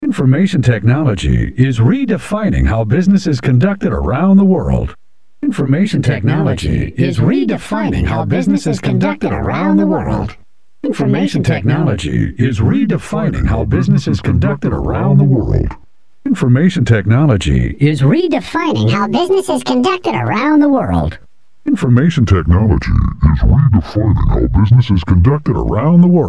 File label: pitch shift